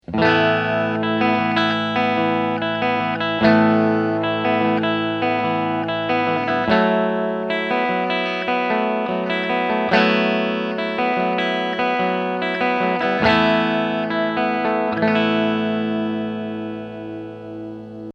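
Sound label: Bridge PU Clean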